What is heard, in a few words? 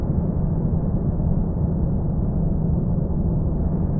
InfiniVerb Noise, sparse soundscape Loops